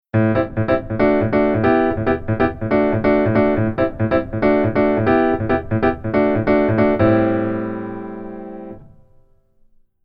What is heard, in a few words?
keyboard rhythm